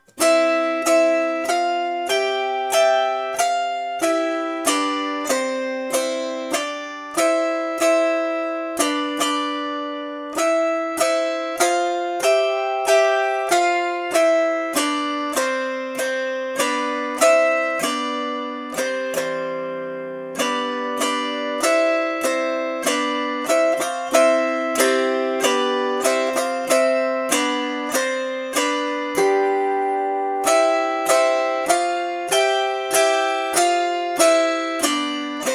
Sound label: Ode To Joy pinches